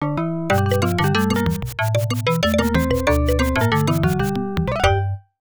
Stage Clear; Peter Pack Rat; Atari System 1; arcade

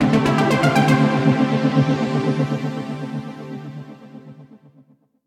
Duel - Lose; Yu-Gi-Oh! Rush Duel Saikyo Battle Royale

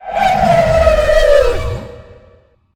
SUMMONER CHEER

